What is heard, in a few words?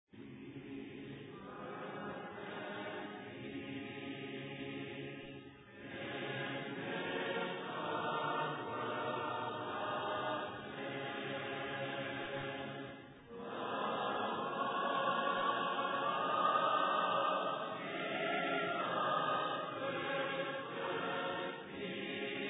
a capella hymn; peace perfect peace